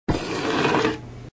Snow Shovel; Sesame Street Seasons

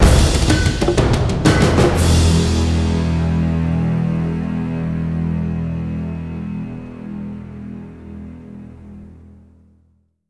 sounds music; Halo Combat Evolved; Xbox

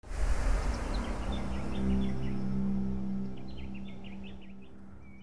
Morta Logo Disappear; children of morta

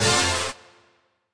Quiz Answer

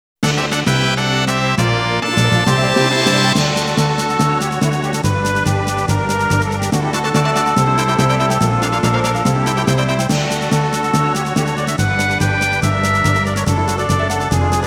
Victory!; SaGa Frontier Original Soundtrack

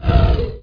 bantha roar

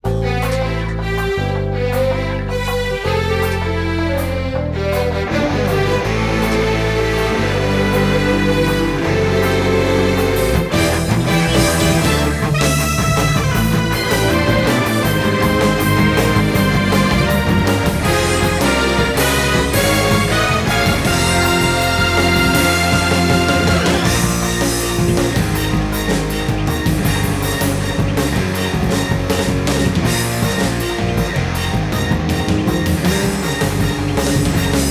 Duel Lose; Yu-Gi-Oh! Duel Monsters Saikyo Card Battle; 3ds gamerip 2016

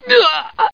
Die

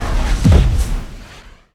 Float; lego ninjago rise of the snakes

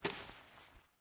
Take item; miside demo windows gamerip 2023

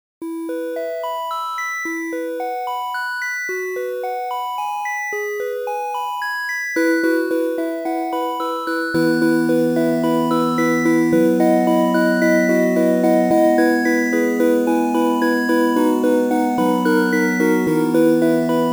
Save Complete (8-bit); Lenna's Inception

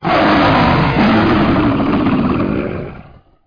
Dragon Roar; CastleMiner Z; Xbox 360

